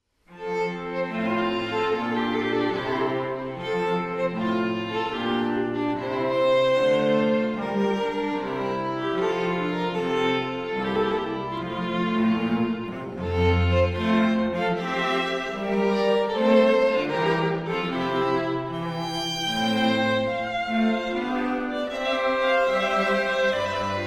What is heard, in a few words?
Quartet; Air from Water Music, Handel